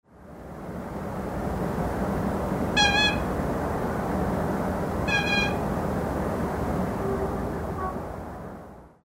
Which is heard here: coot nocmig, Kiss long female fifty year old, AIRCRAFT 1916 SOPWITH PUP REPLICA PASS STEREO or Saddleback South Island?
coot nocmig